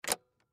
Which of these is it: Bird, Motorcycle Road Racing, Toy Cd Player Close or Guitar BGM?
Toy Cd Player Close